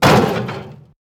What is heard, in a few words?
objects, World of Tanks